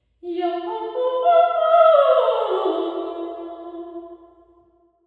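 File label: womans club impulse response sing; spokane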